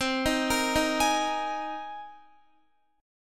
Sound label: C Minor 7th Sharp 5th, C, Guitar; Cm7#5 arpeggiated; JGuitar